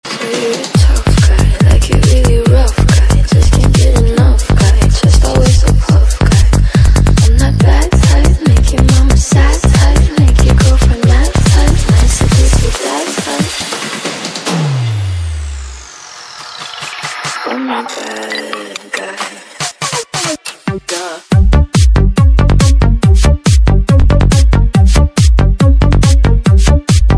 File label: Trance Remix